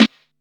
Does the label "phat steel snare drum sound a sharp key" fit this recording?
Yes